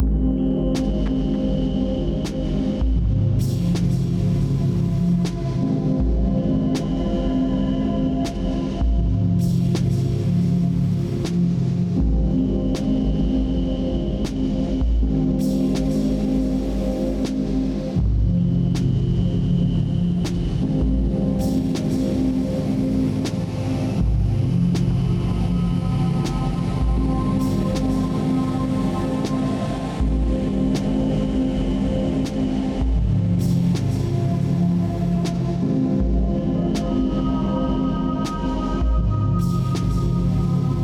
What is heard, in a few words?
deep, music; project-thought-experiment; theturtlebox